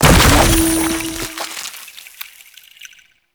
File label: Power Shroom Proj Explode; Warframe; Nokko; Empowering Mushroom